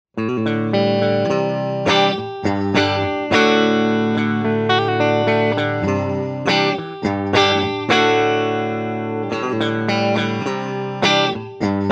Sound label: Powerplus neck and bridge